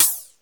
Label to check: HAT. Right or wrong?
Right